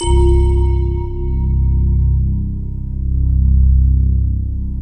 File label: DIGIBLEND; OMI - Universe of Sounds